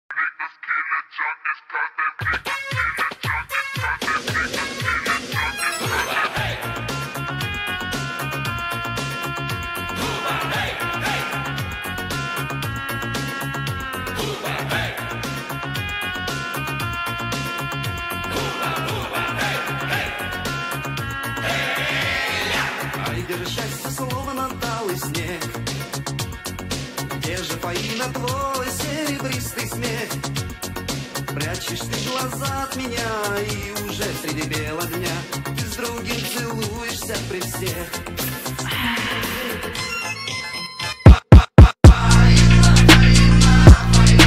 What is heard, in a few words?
Phonk Remix